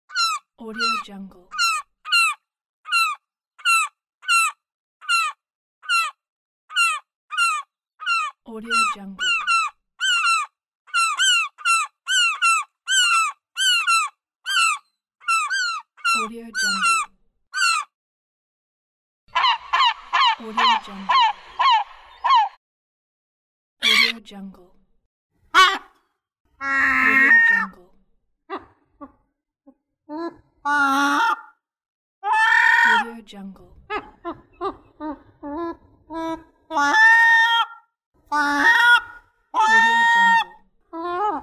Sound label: seagulls